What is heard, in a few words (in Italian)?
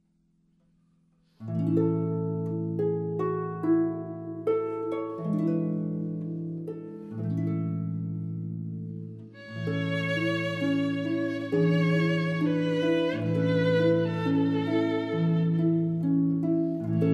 arpa violino; Franck Panis angelicus; matrimoni